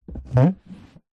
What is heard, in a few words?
leisure board game box close; telstrations